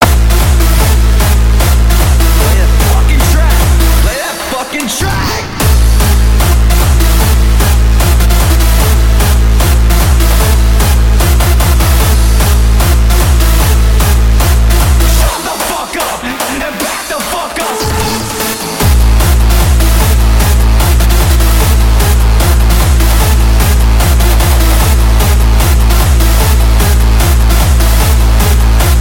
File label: Hardbass